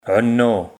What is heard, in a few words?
singing